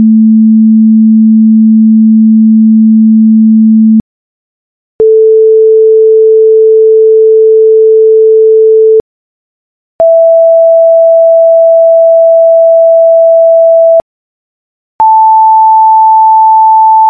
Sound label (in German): schwingung harm